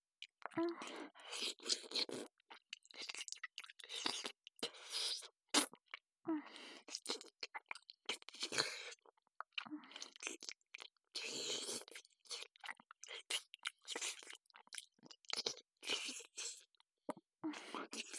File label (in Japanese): ゆっくり咥えているフェラ 最後に吸っているような感じ （掃除）